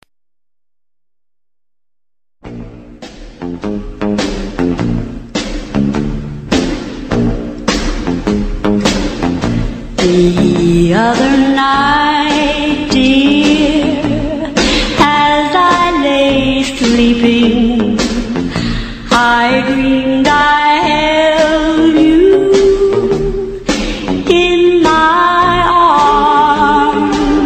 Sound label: americans sing ukrainian folk song